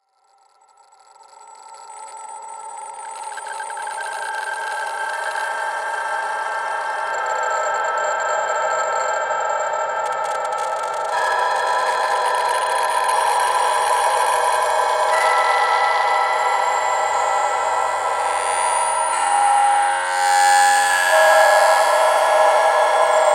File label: granulator